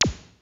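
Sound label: HIPHOP KIT, DRUMS; Kid Nepro; Elite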